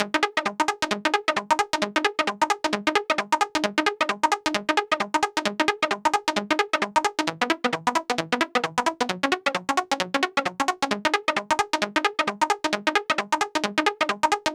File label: 132BPM Shuffle Blipper DRY, G# major; Vengeance Samples; Jericho Kit